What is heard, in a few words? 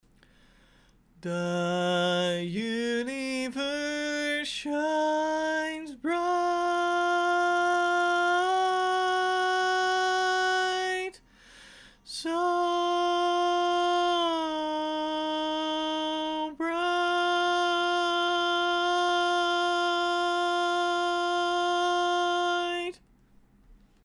Tenor, barbershop